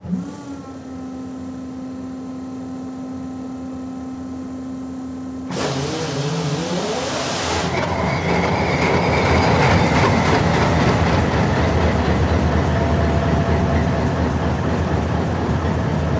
Alco 251; RailPro

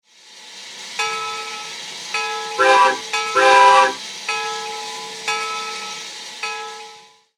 Aermore 4 Chime; RailPro

